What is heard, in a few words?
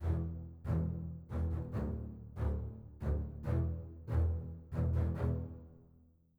basses; Netease; morale full